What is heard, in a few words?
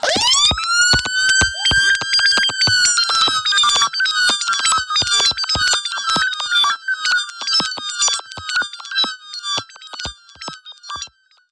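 Modulation Processed, alarm siren samples 125bpm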